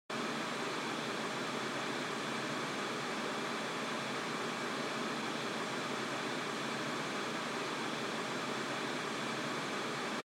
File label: TV Signal Noise Far